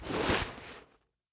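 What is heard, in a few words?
crowbar draw, weapons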